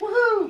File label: woo hoo; Voice; ooh ahh